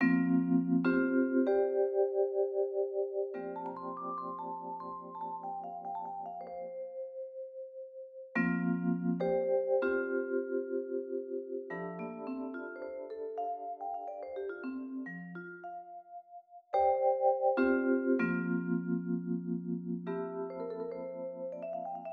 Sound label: Vibe Trio